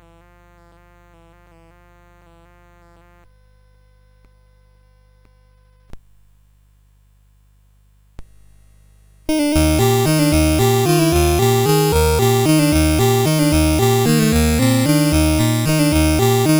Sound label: Waltz No2, CSG8580R5